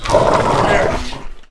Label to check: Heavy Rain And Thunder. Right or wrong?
Wrong